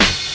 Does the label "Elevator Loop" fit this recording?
No